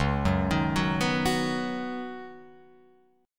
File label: C sharp 7th Suspended 2nd Suspended 4th, C sharp, down, Guitar, Open F; arpeggiated; chord